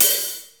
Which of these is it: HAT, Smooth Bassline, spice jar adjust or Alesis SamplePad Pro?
HAT